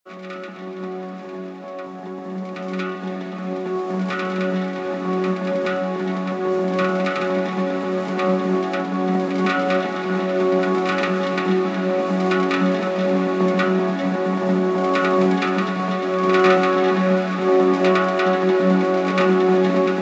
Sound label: Handpan F